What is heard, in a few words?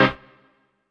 HOUSE, House Dance